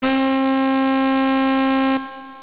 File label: tenor sax c3